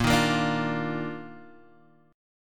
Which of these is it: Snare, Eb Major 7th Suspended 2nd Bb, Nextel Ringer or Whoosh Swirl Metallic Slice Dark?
Eb Major 7th Suspended 2nd Bb